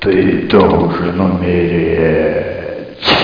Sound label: PIG WRN; DUKE3D; DUKERUS